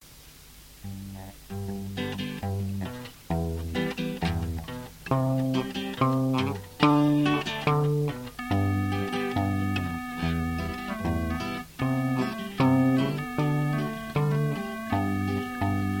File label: instrumental